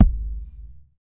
smooth bottom end kick drum sample a key, steel kick drum sample